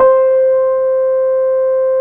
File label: Guitars, Fairlight CMI IIe